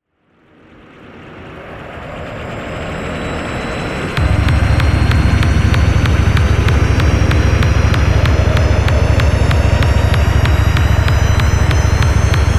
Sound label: cauldron; wizards